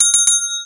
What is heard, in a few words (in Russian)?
zvonok kak v kolokolchik